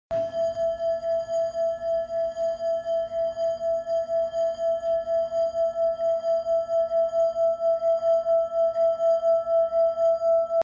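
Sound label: brown buddha E, singing bowl; Silver Tibetan Meditation Singing Bowl – 5″D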